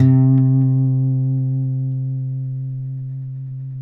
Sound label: Finger C 3 L, Guitars; Spectrasonics; Hans Zimmer Guitars VOL-1